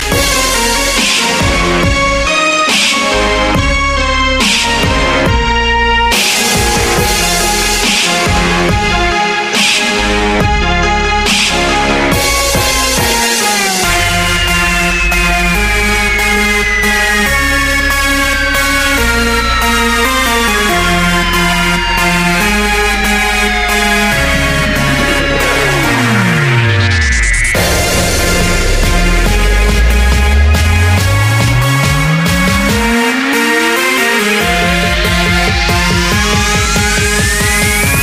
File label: Drubstep